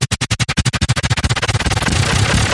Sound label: Glitch Rapid Shutter